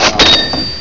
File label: ching